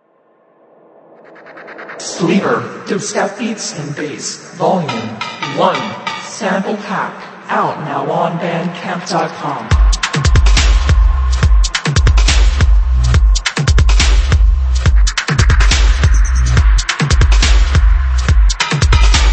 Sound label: Dubstep Beats and Bass; Sleeper